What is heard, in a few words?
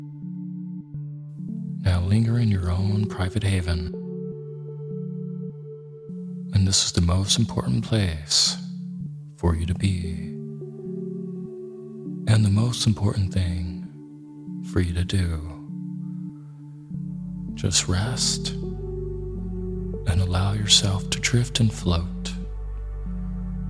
Deep Sleep With Isochronic Tones